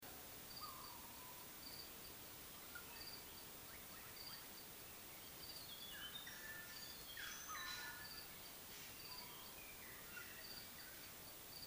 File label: Sambar Langur Calls